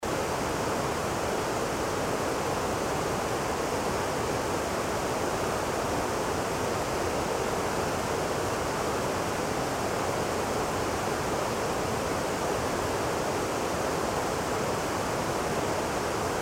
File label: White noise